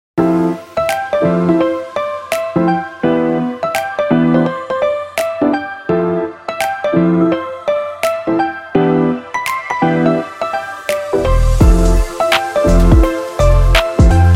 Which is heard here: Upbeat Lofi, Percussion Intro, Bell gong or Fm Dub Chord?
Upbeat Lofi